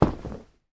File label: household rug roll out on carpet floor